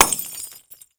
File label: Lightbulb Break; Crashes